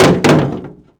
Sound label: grenade metal